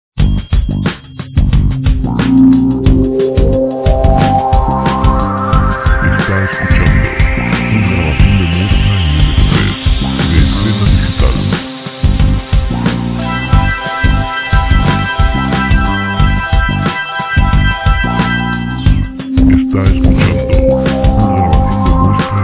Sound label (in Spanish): terror sintonias, jazz